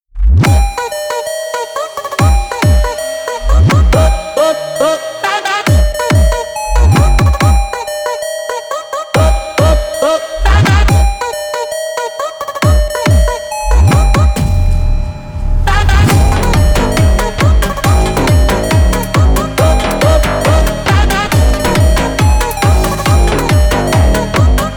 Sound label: speed up brazill phonk gym phonk 2025